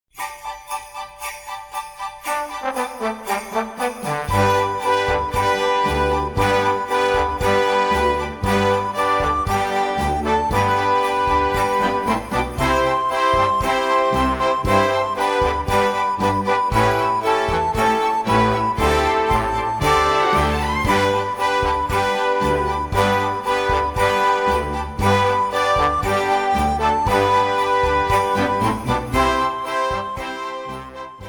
Jingle Bells

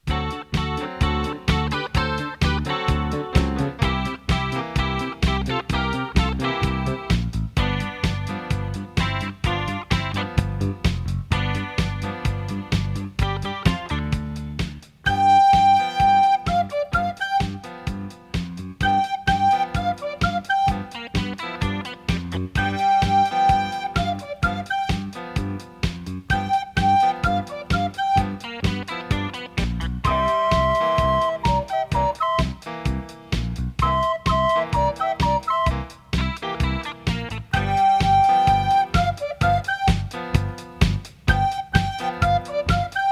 Rock

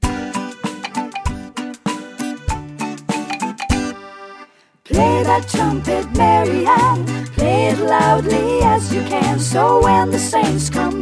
Play That Trumpet Vocal

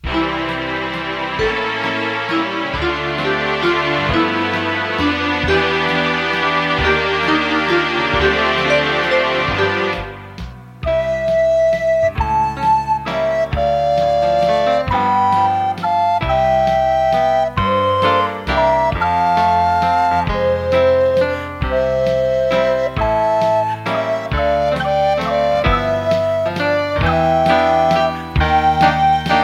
Waltz

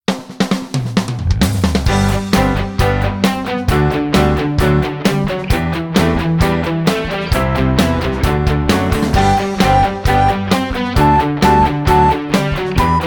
Hop Skip Jump 132

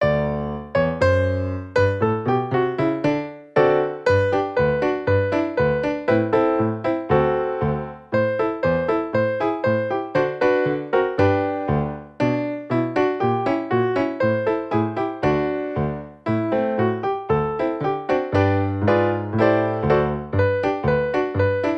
March

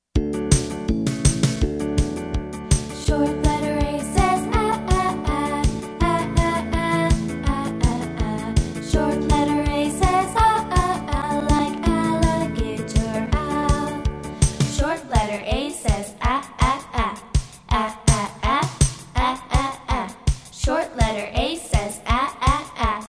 Short Letter A Phonics Song

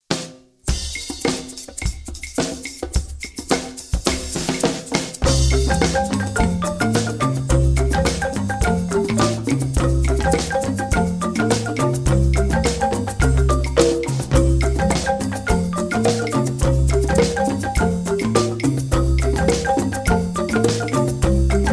Jazz

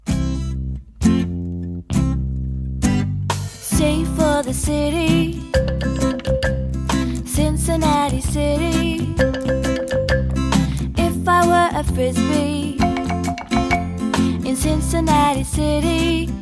Vocal

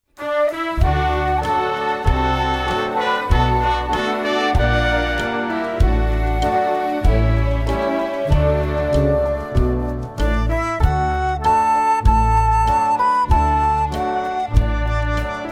Oh Susanna 96 BPM

